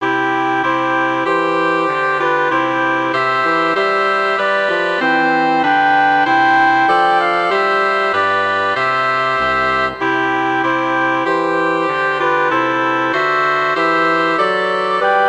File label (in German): holzblaesquartett; hoert ihr wie die glocken klingen